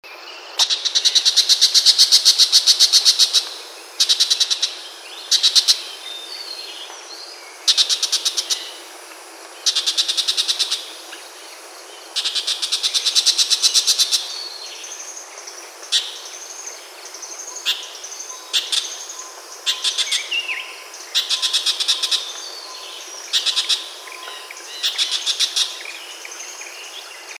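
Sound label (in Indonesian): cendet loreng macan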